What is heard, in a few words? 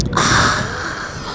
Devil Breathe